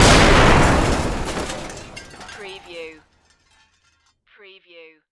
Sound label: Explosion Metal Debris; Sound Effects Bible